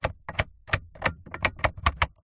transport car fan speed dial click turn toyota corolla